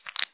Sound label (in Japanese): くちゅ音単発